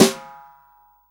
SD, REAL DRUMS, SWING KIT

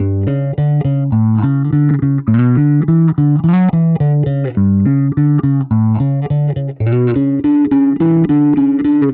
Bass, Indie Rock; Sonic Foundry (Sony Creative Software); Indie Rock Anthems

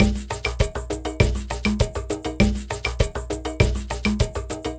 Ensemble, Latin Percussion

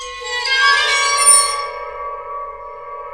WATERPHONE; WATERFN

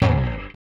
Rickenbacker Bass; Airwindows; Rick-Xtras

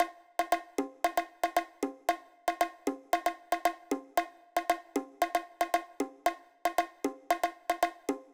Bongos, E 115bpm; Zero-G; Nu Disco; Construction Kits

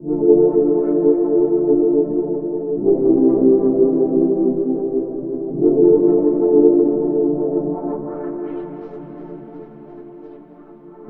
Keys, Drum n Bass; KJ Sawka - Drum n Bass & Beyond; Synth & Melody

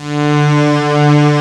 STEAM SYNTH; East West; Global Sounds

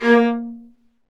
String Sections, Violas Marcato

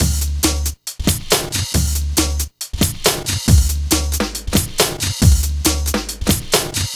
138, drumloops, Hardcore Breakbeat; Big Fish Audio; Hardcore Breakbeat Action

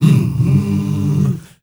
MALE HUM, M. HUMMERS

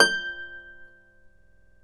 Celtic Harp; E-MU Producer Series Vol. 7 – Old World Instruments

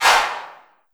LARGE ROOM 808, REVRB

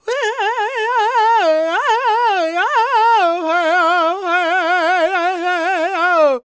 World Voices, Lakota

